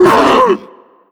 pain, zombie vox; creatures

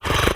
breath fast in; reviver

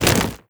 single, antlion wings; creatures

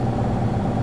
machine; ambient; Counter Strike Model Pack; CS:GO; survival

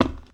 footsteps glass; player

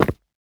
footsteps tile; player

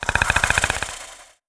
warning, antlion; creatures; vox

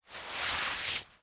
foley jacket

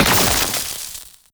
smoke release; creatures

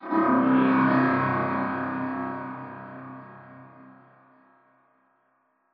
strider distant; Half Life Alyx; combine; c17

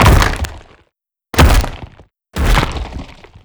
crack, destruction; headbutt; a4 strider

